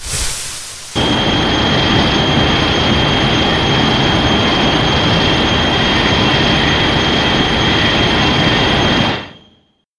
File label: Heat Ray Charge Up + Heat Ray; Shin Godzilla